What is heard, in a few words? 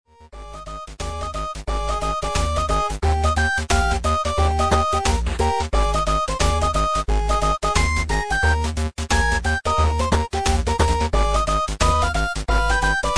chiptune version